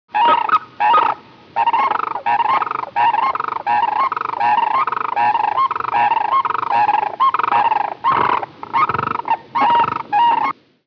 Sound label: Sandhill Crane Unison Call; winter